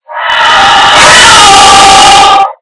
cave

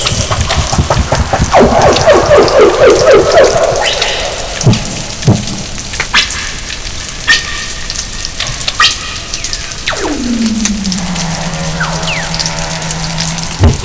Weddell; 12 sec; Terhune

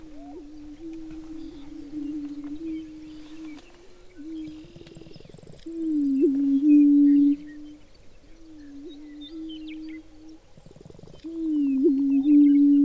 Chicken Boom Chorus; Walsh

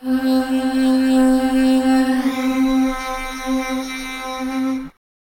detuned sound

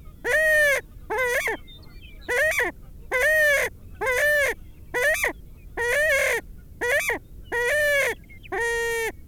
whine sound; whalen

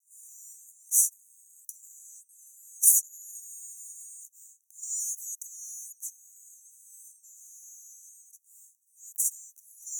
sing

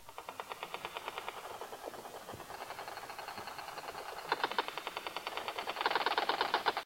silver perch

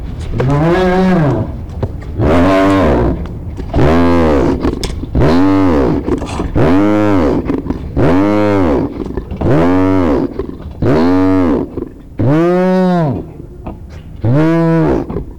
hyena bear cub

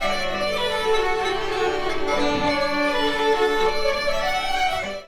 jam

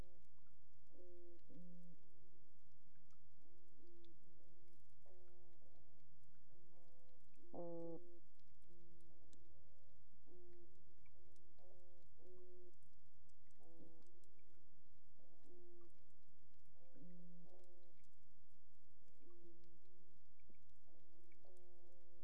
Toadfish; Putland

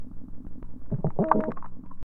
Toadfish; Rycyk

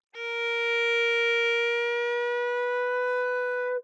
flute

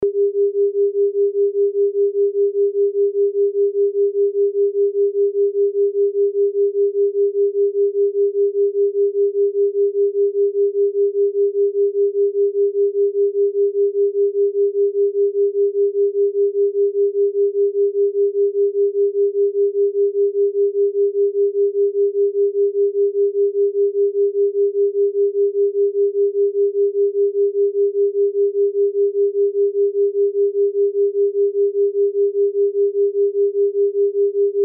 400 and 405 beating

